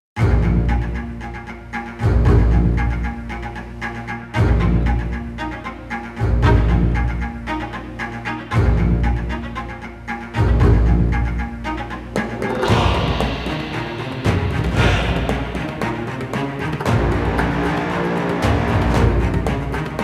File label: Bgm Last boss Field; starbites